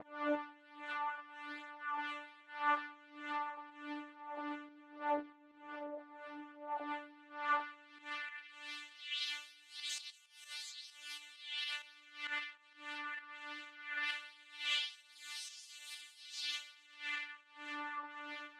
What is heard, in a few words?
Drifting Fly